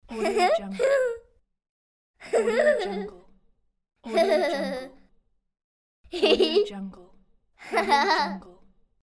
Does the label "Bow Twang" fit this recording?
No